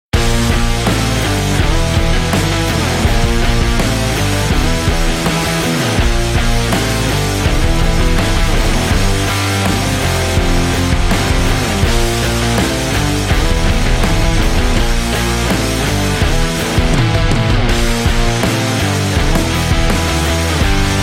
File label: Ident Rock Blues; blues intros